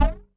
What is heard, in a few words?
Synth OneShot; Sonic Foundry (Sony Creative Software); Rhythmicronics